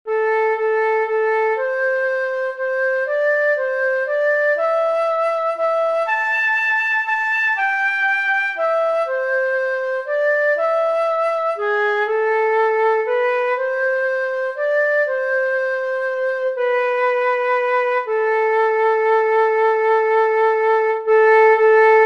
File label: Flute Trio; That Easter Morn